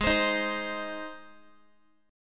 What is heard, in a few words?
Ab Major Ab, Guitar; Ab strummed; x,16,15,13,16,x; 4A,4E,5A,5D,5G#,6C#